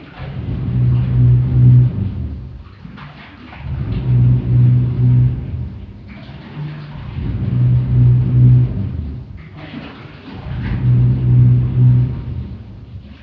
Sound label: nuke room, ambient